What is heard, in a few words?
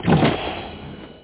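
open door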